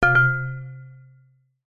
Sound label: multimedia alert notification ui ping chime mallet like error; zapsplat